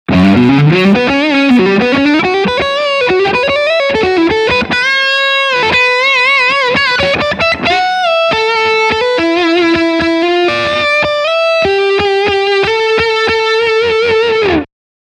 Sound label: Lick Front HB